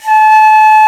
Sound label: Venu A4; Planet Earth; Digital Sound Factory Planet Earth